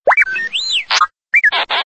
r2 d2 wake up

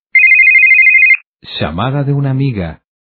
friend call

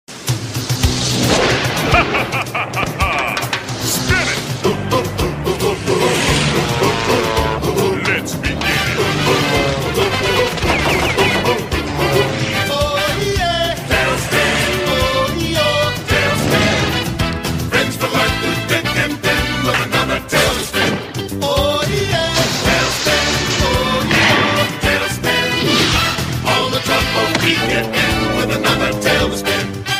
theme song; talespin

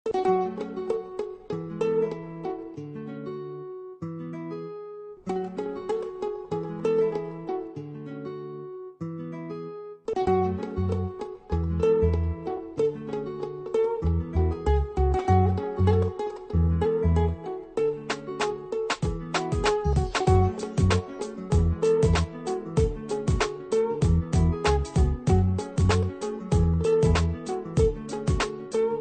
instr

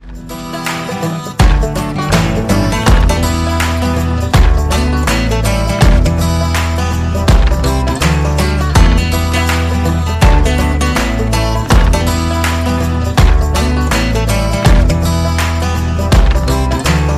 ringtone; far cry 5

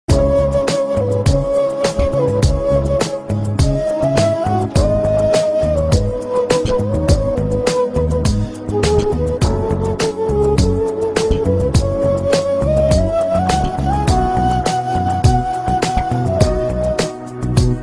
nice soul

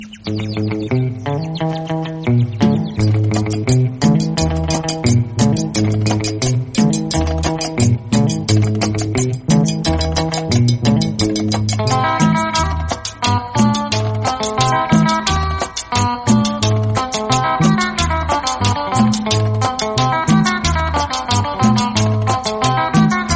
cumbia

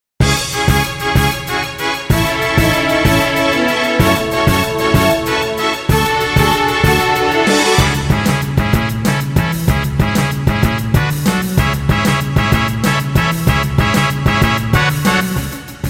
normal battle; final fantasy x

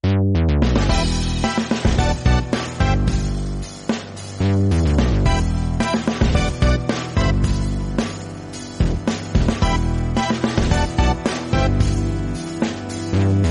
romantic

